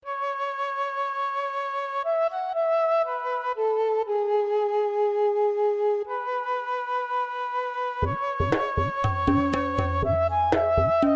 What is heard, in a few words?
Romantic India Single Vocal